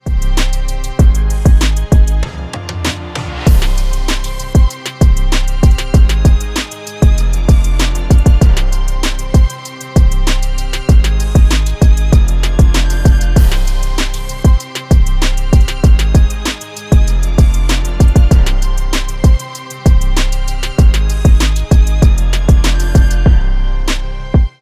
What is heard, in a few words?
97BPM CMIN